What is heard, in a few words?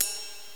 ride; Multimedia Toolkit